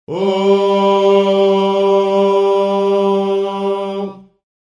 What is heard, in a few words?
low voices male, Male Oh